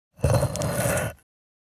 Stones scratching

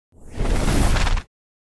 Sci fi door spaceship hatchway

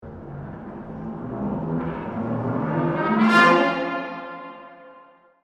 Cartoon orchestral danger

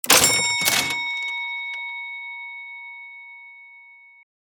Purchase ding; shorts sound effects

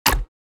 Educational kids game menu ui tab switch

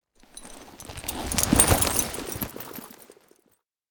Horse saddle and bridle dismount

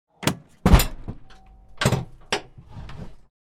Airplane door opening exterior perspective

